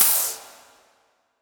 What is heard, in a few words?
hold start; ichni; Wwise